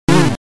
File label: Broken a Wall (SFX)